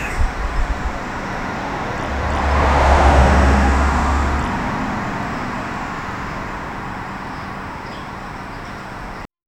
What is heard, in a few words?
peaceful ambience, neighborhood